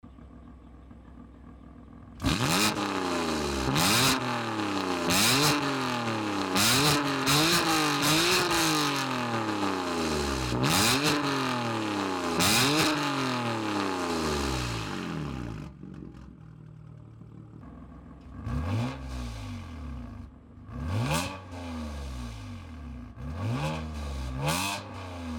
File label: BMW M3, exhaust